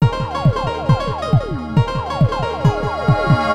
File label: SHOOT; Mario Artist; Polygon Studio; N64; Sound Bomber